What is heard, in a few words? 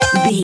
retro collect item stinger